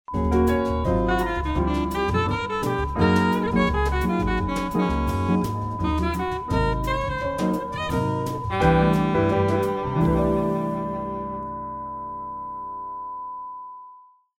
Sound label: groovy jingles